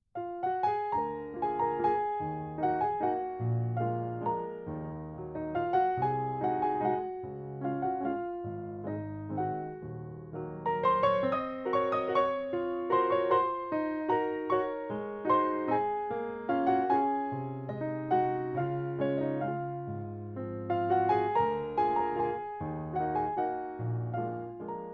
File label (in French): Valse lente